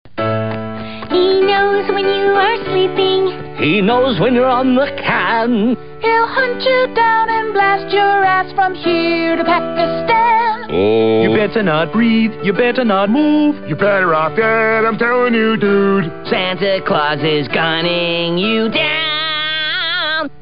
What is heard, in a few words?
Xmas song